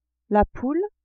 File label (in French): poule, animaux